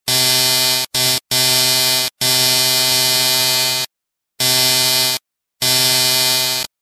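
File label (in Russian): прерывистая работа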